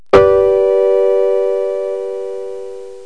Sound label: piano, notes; ClickArt 300,000